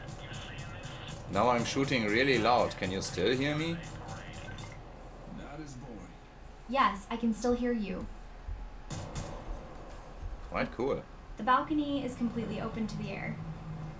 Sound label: original signal